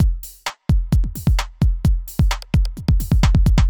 fast break 130

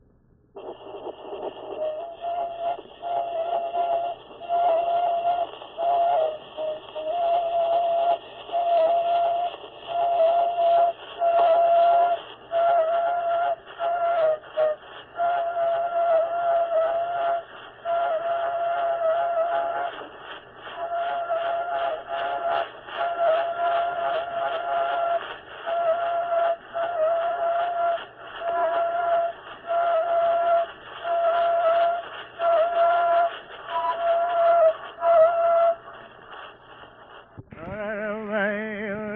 Place-name chant, Hawaiian; World Music; Na Leo Hawai'i Kahiko - Voices of Old Hawai'i

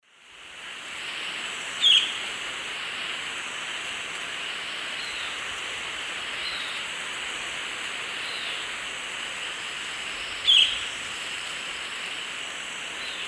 Megarynchus pitangua, call; S. America; Eastern Lowlands

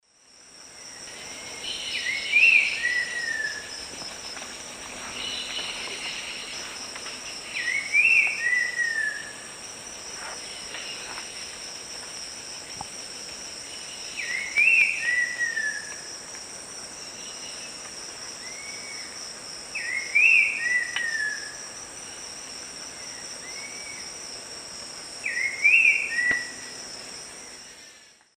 Saltator grossus, song; S. America; Eastern Lowlands

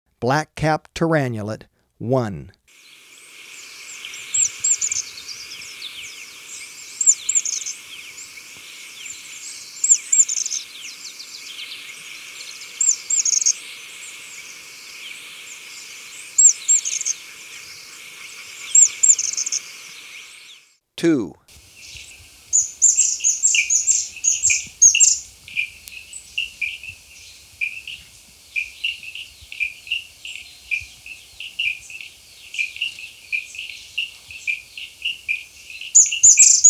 Black-Capped Tyrannulet; S. America

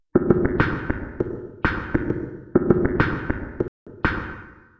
Beat; Sound Effect Library; Electronica; Solo Beats; Equation

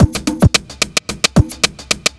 block rock kit

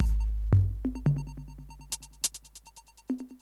techno 140

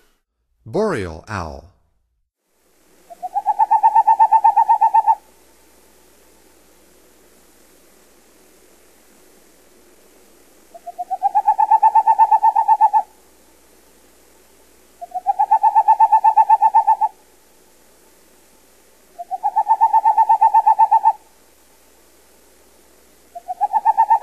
Boreal Owl, Songs of Birds of North America; Stokes

